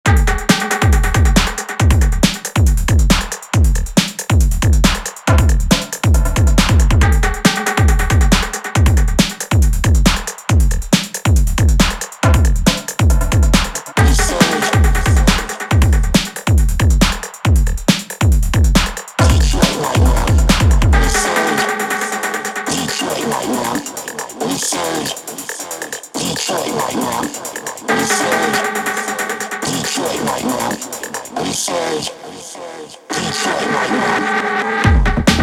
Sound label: Percussive